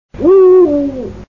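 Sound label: eagle-owl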